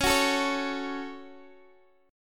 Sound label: C sharp Suspended 4th Sharp 5th, Guitar, DADGAD